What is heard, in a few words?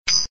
DING; Book Of Ra